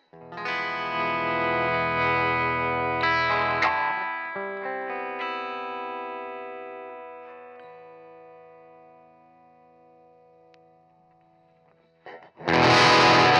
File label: Gtr Solo